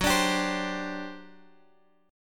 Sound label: G Major 7th Suspended 4th, F sharp